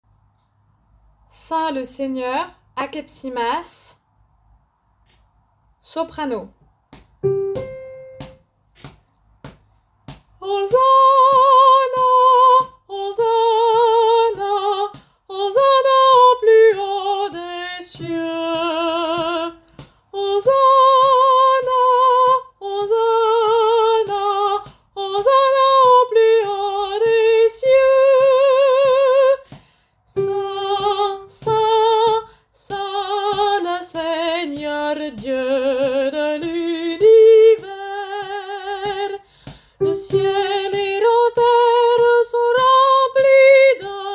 Petite messe Sanctus soprano; Akepsimas

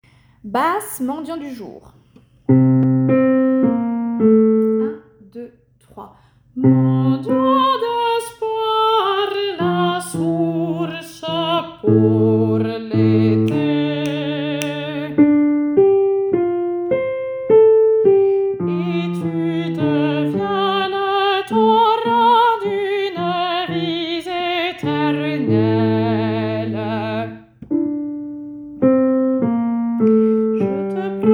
Mendiant du jour basse

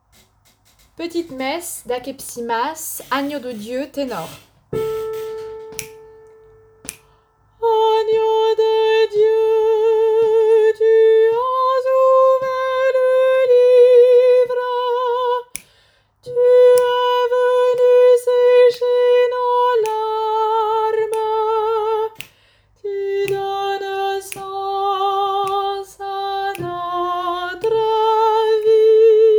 Petite messe Agnus tenor; Akepsimas

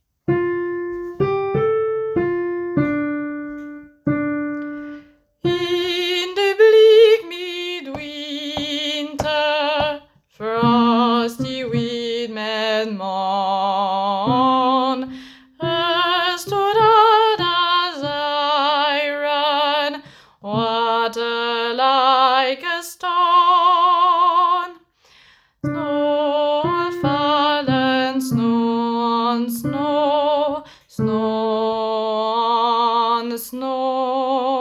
In the bleak midwinter basse

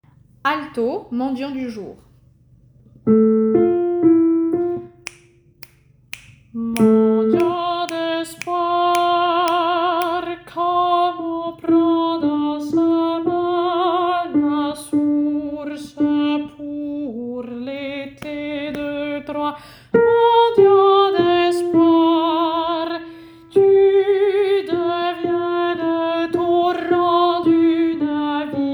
Mendiant du jour alto

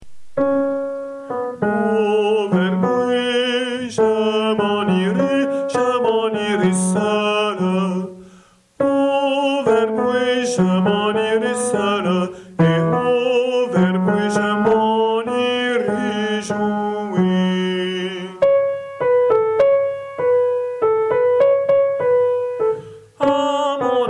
Au vert bois T

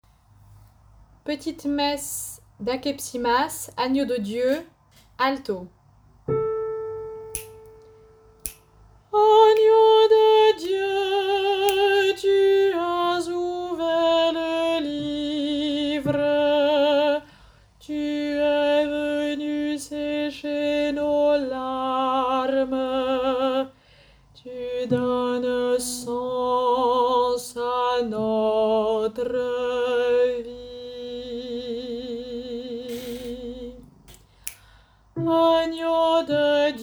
Petite messe Agnus alto; Akepsimas

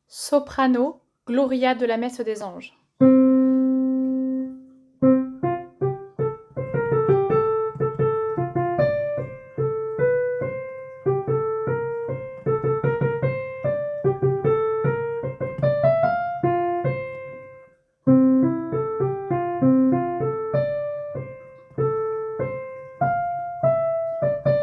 Messe des Anges Gloria Soprano

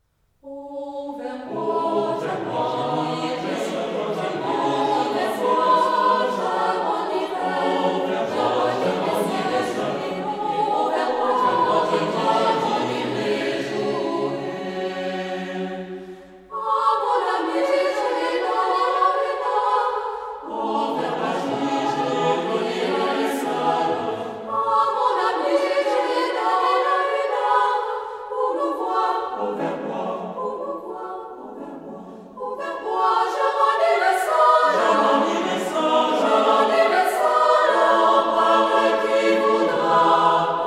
Au Vert Bois; Janequin

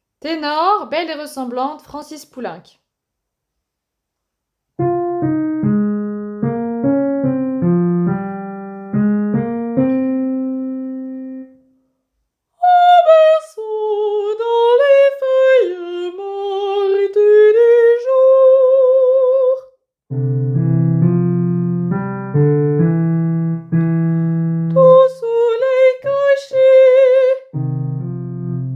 Belle et ressemblante Tenor

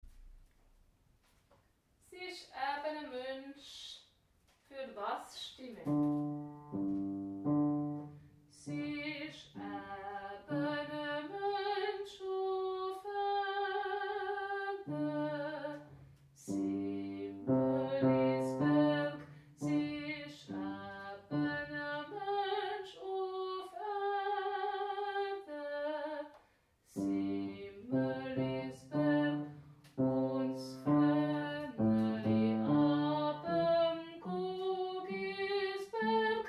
basse

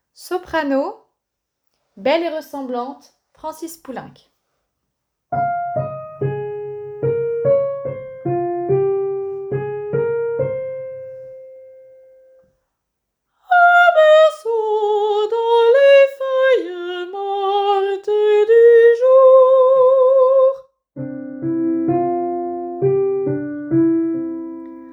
Belle et ressemblante Soprano

